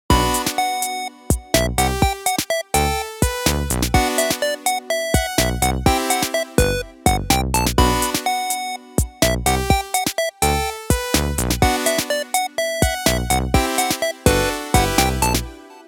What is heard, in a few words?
ringtone